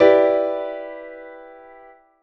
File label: E minor minor 7th